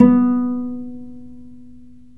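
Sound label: strings violoncello pizzicato, Chamber; Roland L-CD702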